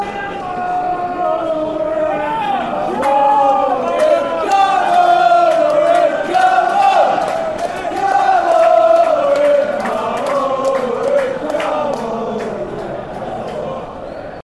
come orient faster fanchants

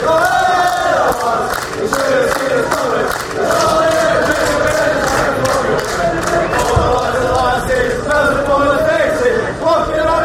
haway lads fanchants

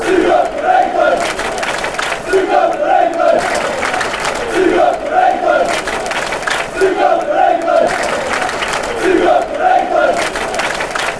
blackburn chant

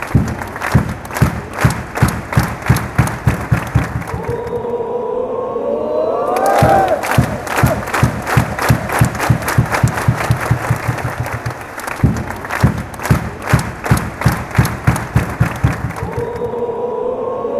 klatscht rapid fanchants

